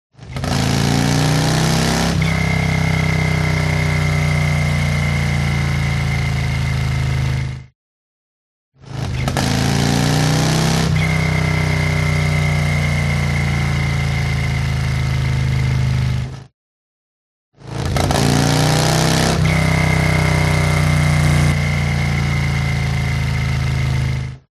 TRANSPORTATION MOTORCYCLE Harley Accelerate Decelerate Whistle